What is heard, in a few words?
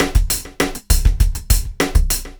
brush muff, drums; Loopasonic